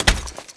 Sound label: ui magic pocket click